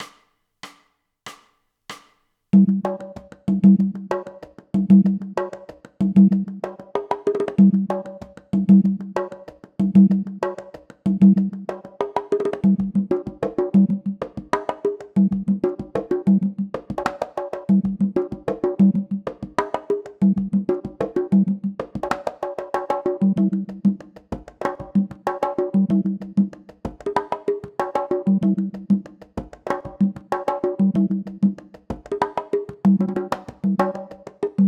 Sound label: Congas